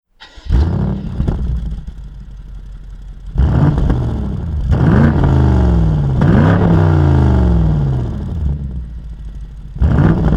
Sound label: BMW 850i Cabrio; Remus; Aerotechnik